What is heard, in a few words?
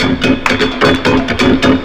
LOOP; SoundFactory; DRUMLOOP